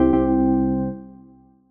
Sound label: short success